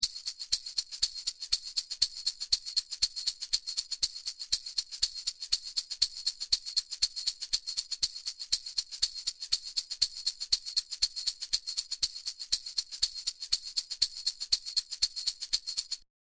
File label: shaker